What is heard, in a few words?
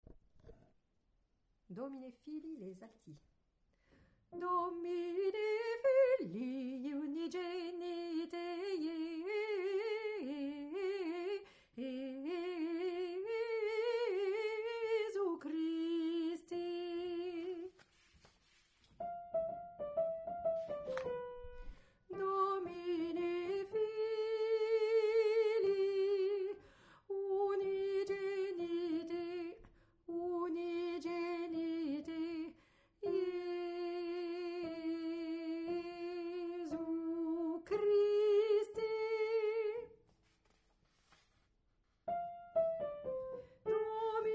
Alto, Vivaldi - Gloria - Domine Fili